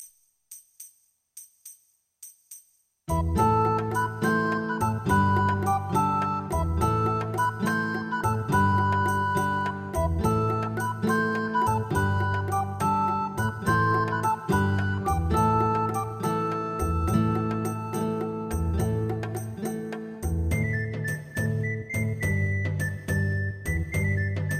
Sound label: Tavern; Azural Kane's UO Juke Box